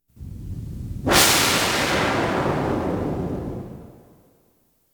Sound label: Science Fiction effects, Lasers, Light beams